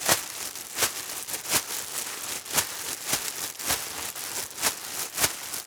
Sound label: plastic bag shake